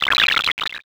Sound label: text anim